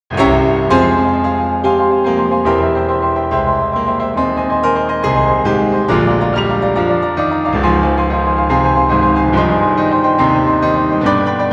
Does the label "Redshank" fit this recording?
No